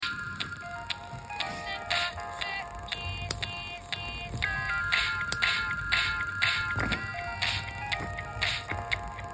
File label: sample repeat pwm; poppo-ya